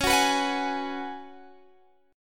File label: C sharp 7th Suspended 4th C sharp, Guitar Half Step Down